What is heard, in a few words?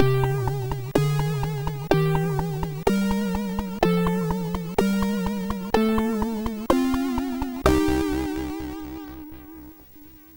sid MOS6581R2; Alien 8